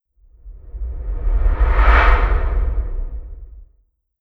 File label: Dark Magus Impair Wind